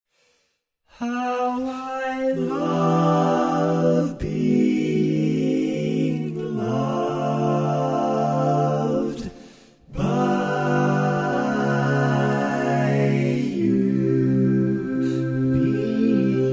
All Parts, Barbershop Tags

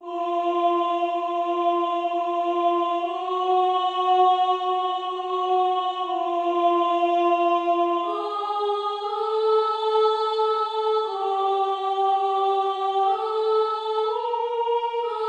tenor

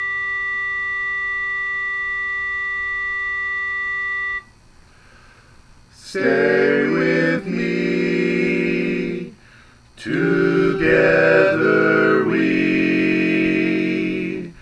All Parts, barbershop tags

